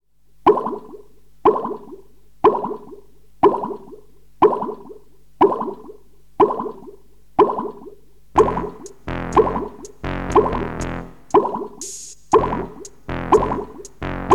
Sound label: Drip drip drip; Weirdomusic